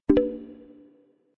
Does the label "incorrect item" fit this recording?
Yes